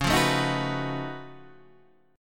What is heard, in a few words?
F sharp Minor Major 13th C sharp